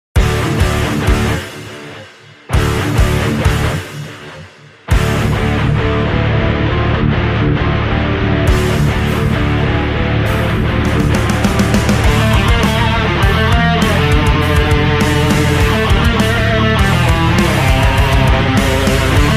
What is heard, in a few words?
Metal Instrumental Cover; Brigada OST